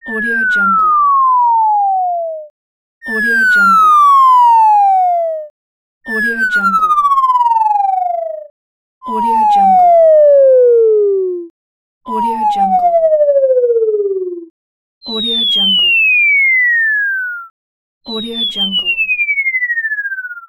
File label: cartoon falling; soundsground